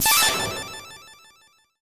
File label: Goal Accomplish; UI